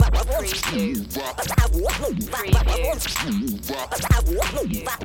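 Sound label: Scratch Crazy 95 BT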